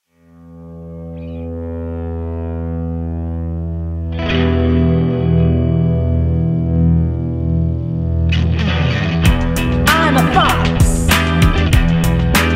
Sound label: Song I'm a Fox